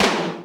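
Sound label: Tom Md Thrash, Alesis D4 drum machine; 300 Drum Machines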